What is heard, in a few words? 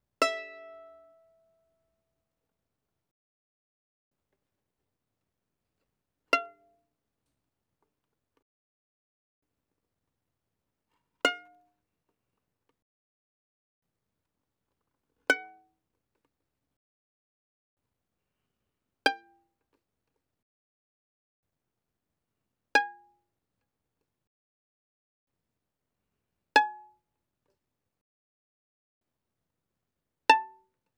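Violin pizzicato fortissimo sul E E5 A5; Strings; violin2012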